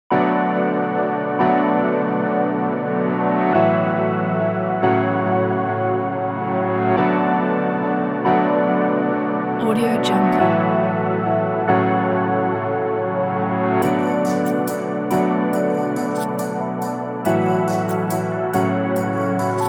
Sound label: chill trap beat